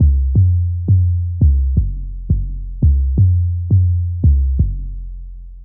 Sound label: Hammer Bass, Drum & Bass; Fluid Dynamics